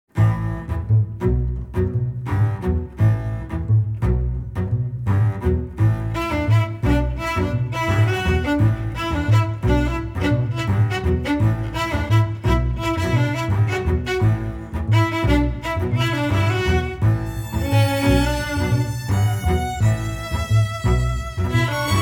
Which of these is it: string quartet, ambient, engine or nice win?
string quartet